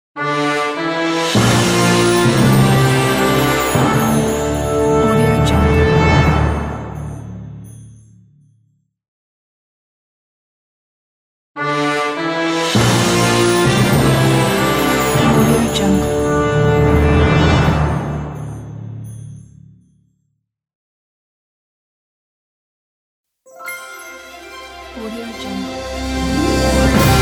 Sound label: orchestral logos pack